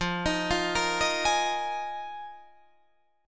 F Minor 11th, Guitar DADGAD

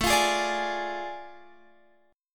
A Major 7th Suspended 4th Sharp 5th Guitar Open F; AM7sus4#5 strummed; x,16,17,15,17,15

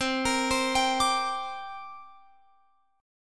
C 7th Suspended 2nd, Guitar, Open F; C7sus2 arpeggiated

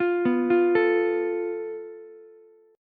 F Major Flat 5th, Ukulele; FMb5 arpeggiated; JGuitar

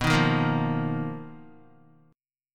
B Major 7th Flat 5th B down Guitar; BM7b5 strummed

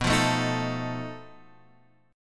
B 7th Flat 5th B Guitar Half Step Down; B7b5

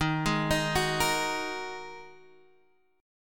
Ab 7th Suspended 2nd Eb

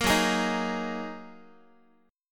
F sharp 6th Add 9th F sharp down Guitar; strummed; 4A,4E,5A,5D,5G#,6C#; 13,14,11,13,x,x; JGuitar

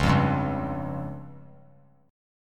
Db Major Flat 5th; strummed; JGuitar

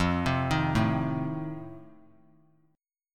F 7th F down; F7 arpeggiated

